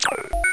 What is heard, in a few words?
ZOOM OUT; FRAGILE